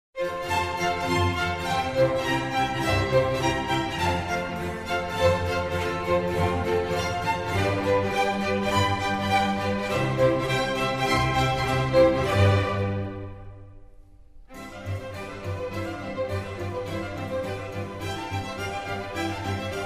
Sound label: Concerto for Strings in F minor; RV142; Antonio Vivaldi